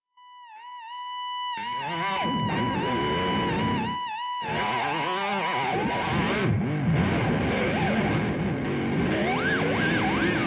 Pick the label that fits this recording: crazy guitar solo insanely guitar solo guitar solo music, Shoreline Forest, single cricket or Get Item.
crazy guitar solo insanely guitar solo guitar solo music